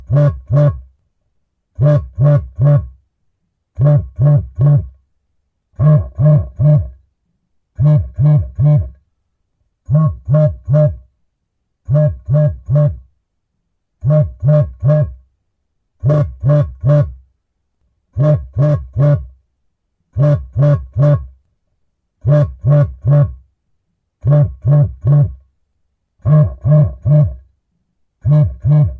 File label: Phone Vibration